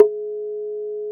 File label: Low Tumba Tone